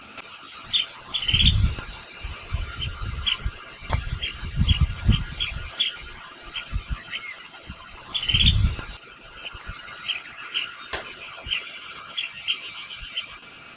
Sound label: Chestnut Tailed Minla calls; Amar